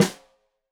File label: Snare Stereo, Drums; Deeply Sampled Drums; SMDrum